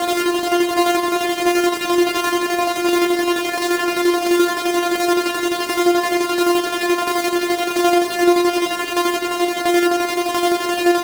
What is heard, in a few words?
174 ambience loop stutter hype F